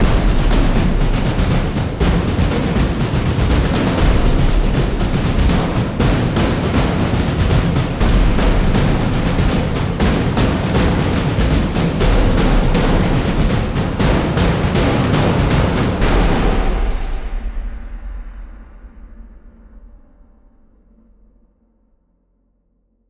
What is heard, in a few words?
Attack Epic 120BPM; Cinematic Trailer Music; BlueFX